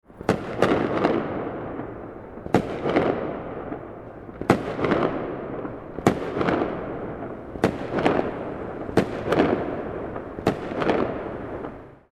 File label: Firecrackers; افکت و طبیعت و حیوانات; آتش